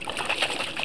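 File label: fish jump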